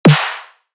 snare; horizon

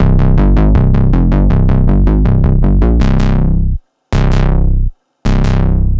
Bass

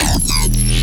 glitch

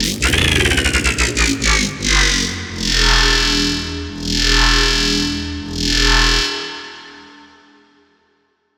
transition death spiral; bossfight

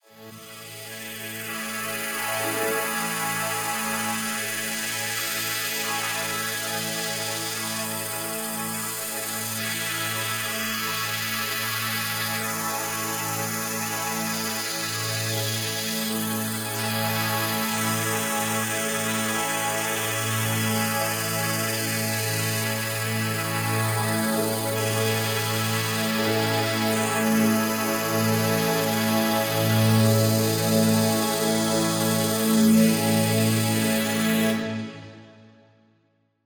Pad

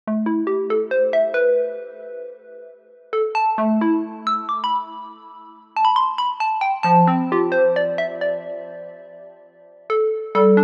polybrute; analog

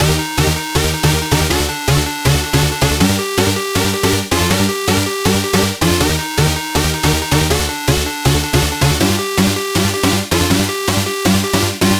Drop Loop 160 G; embers; Caterwaul

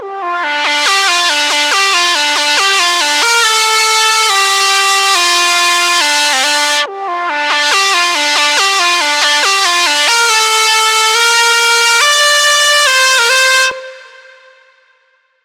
dark magic

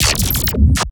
glitch

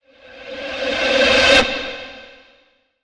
Metro Reverse C; shimmer

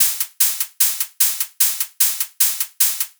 Top Loop; knights; Lancelot

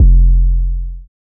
Sub; hera; Baller

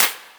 clap

